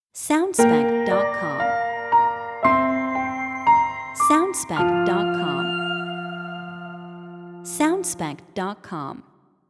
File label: Soft Piano Logo